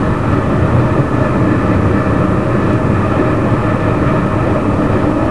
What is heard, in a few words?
Run, class 66 train; OpenBVE